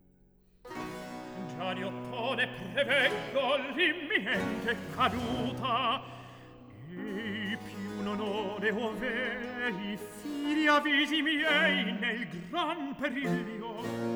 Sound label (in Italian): Recitativo; Atto III Scena 2; Decio; Antonio Vivaldi; Ottone in villa (2010)